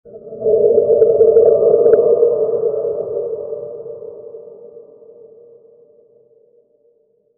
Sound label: ship sinking underwatar